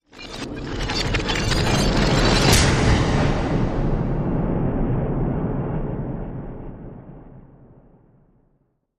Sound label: Reverse Rattle And Hard Impact Rumble Sweep